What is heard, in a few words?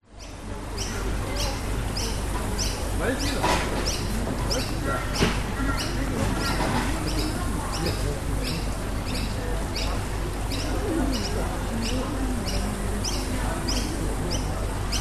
public places zoo australia outdoors rest area birds people insects